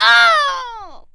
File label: Huntress Death; Player; Dungeon Defender; DunDef